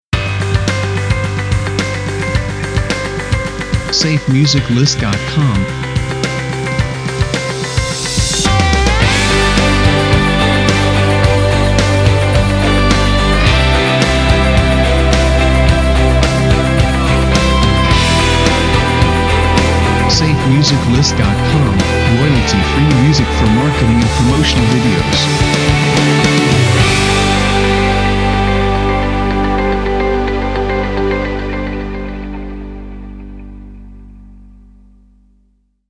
Positive Message; SafeMusicList

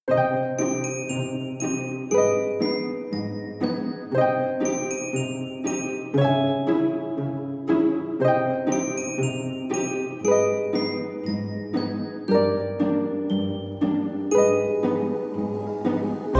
Spooky Halloween Trailer

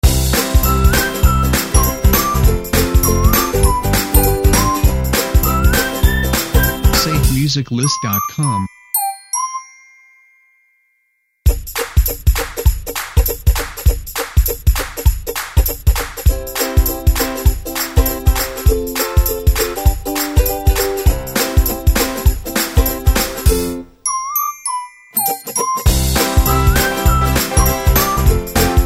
Upbeat Ukulele Intros

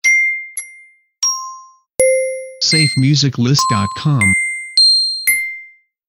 Short Dings

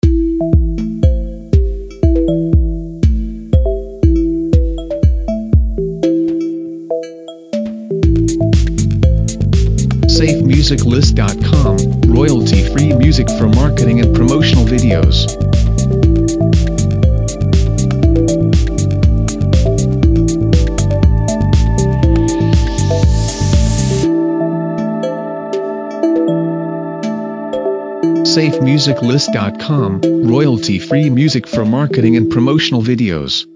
Electronic Technology Video Bumpers; SafeMusicList

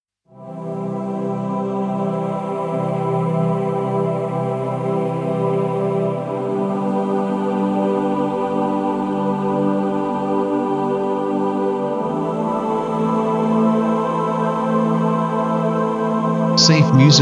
Ancient Soundscape; SafeMusicList

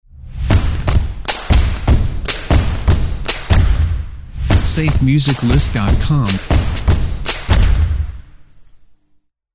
Short Stomps Intro

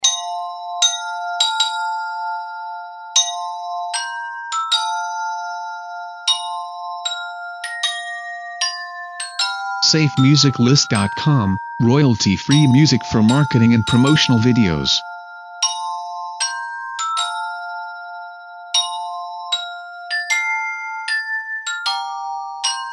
Wedding Bells; Here Comes The Bride

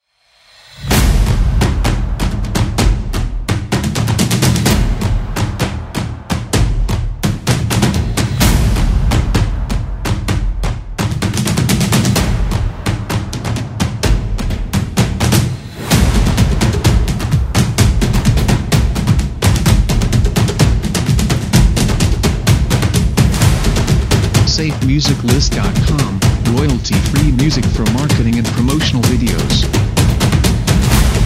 Epic Percussion; SafeMusicList

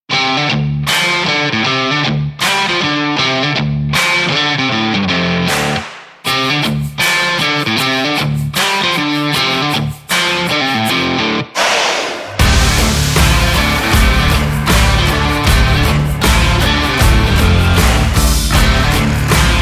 Stomps Rock

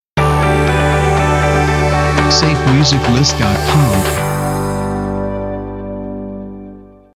Positive News Stinger; SafeMusicList

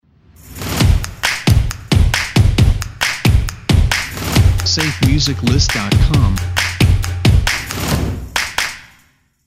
Action Dynamic Stomps And Claps Logo; SafeMusicList

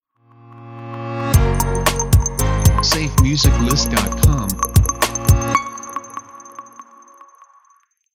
Atmospheric Modern Audio Logo; SafeMusicList

